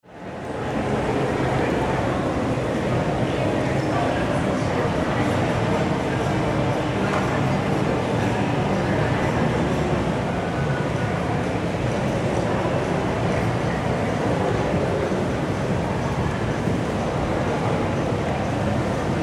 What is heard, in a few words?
Ambience Mall Crowd Walla; Crowds; SFX Bible